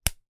foley remove card lable attached with plasic thread from item